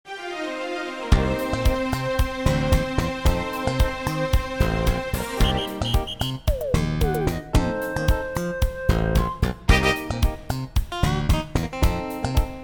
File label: Tentacle Disco; mi2; DOTT